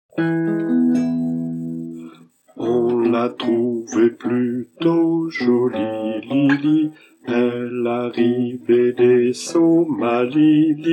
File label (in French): lily basse, chorale; repertoire chorale